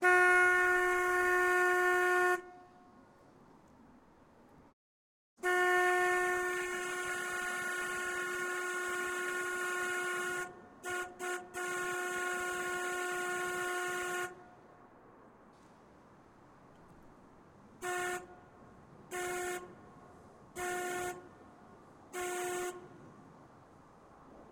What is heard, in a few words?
narrowboat horn various, Narrowboat Gardner 4LW 1960; Pole Position